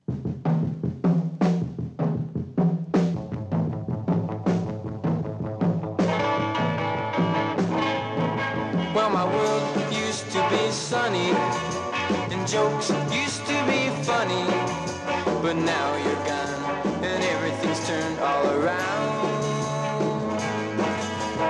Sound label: choir